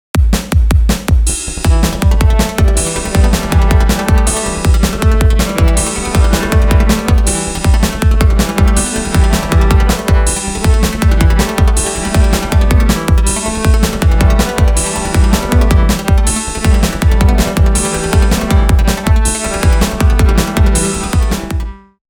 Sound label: rock